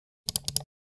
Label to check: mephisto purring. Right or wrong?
Wrong